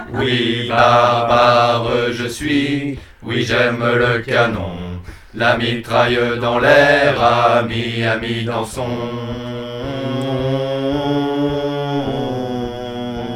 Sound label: La danse des bombes BASSES